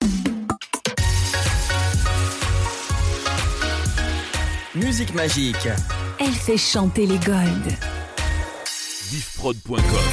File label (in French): Jingles Musique Magique; MXR